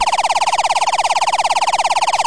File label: laser, VIDEO GAMES; sound effects and noises; tacscan